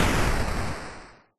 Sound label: death; Minecraft